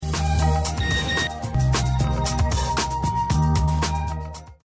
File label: Messaging, Nokia